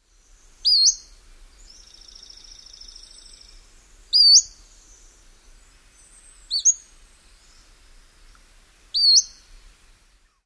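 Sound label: Pacific-slope Flycatcher, calls; WA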